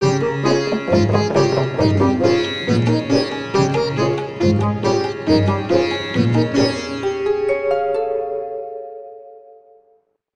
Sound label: Tuba